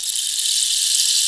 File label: Wide Hive Rainstik up; PX7; Digital Sound Factory Protean Drums